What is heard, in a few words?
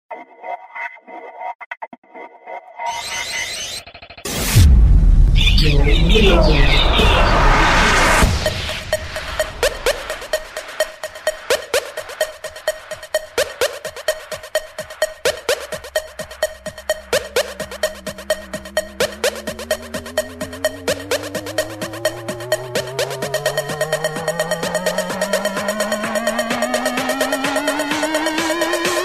Hard Noise - Club Mix